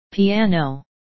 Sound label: piano